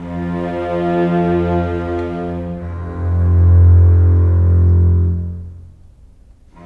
Bass, Cello Viola, Cinematic; Cinematic 06; Orchestral Series

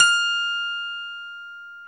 KEY MKS, Pop Pianos, Rhodes; Roland L-CD701; Pop Pianos 3

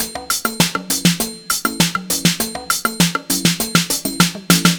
100 No Bd, Miami Drum Machine, Drum Loops 100bpm; Zero-G; Soundclash Nu Dance Electro; Acidized

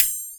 Flexi Up; Mo' Phatt; Digital Sound Factory Mo' Phatt

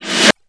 Snare, Yamaha DD-20

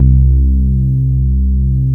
DX soft pad

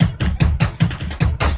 LOOP, Drum & Bass, 150 BPM

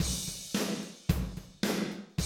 Classic DrumLoops, 110

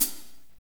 hat, Power Ballad

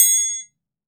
triangle; TRIANGLE2

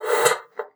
NO SLAP, bassoon; Xsample VOL-6

